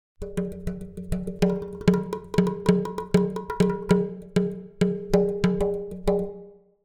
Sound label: Drums Percussion Beat - Additional Percussion; Hollywood Edge; sound designer's toolkit v2